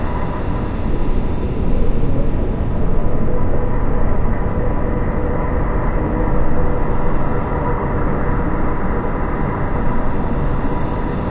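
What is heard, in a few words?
ambient whistling; hellhole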